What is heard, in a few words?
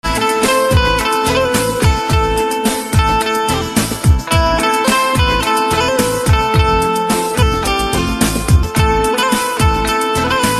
Banjo Instrumental